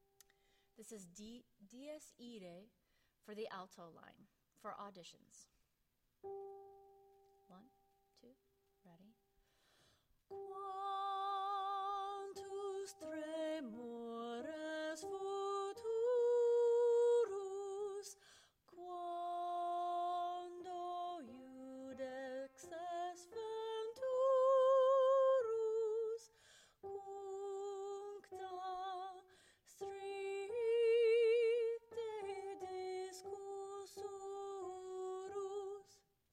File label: dies irae alto